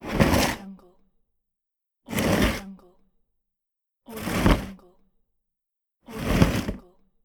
heavy toolbox drag

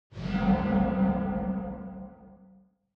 cinematic tension tunnel horror metallic

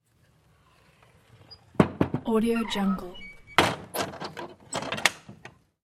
household shutters window wood close; blazznet

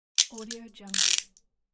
handcuffs closes

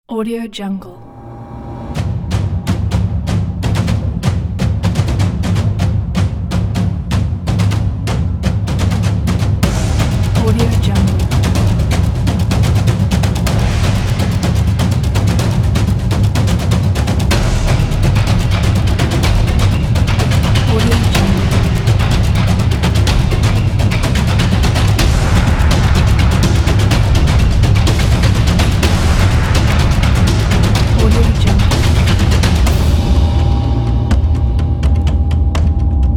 battle drum

